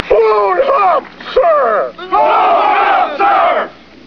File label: Blown Up